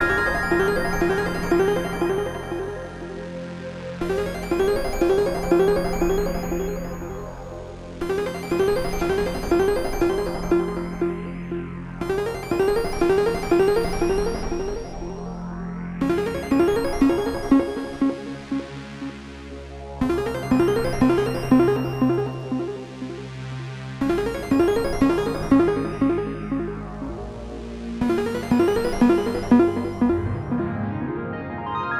Synths; Headlander

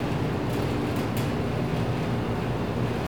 Amb Diner Hum Loop; Lucius III

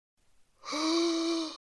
Trample Starter; Goddess of Trampling

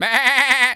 goat baa stressed hurt; Infernal Radiation; macos

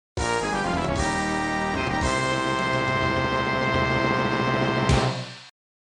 Victory

